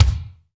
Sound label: BD, DR BD; Best Service Gigapack 2